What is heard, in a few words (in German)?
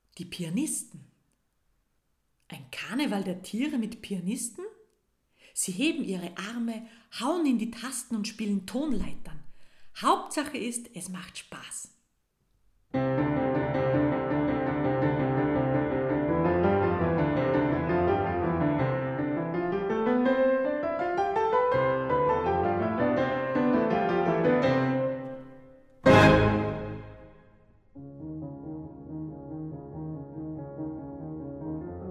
Die Pianisten; Camille Saint-Saëns, Der Karneval der Tiere